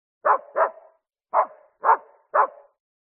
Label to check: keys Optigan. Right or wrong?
Wrong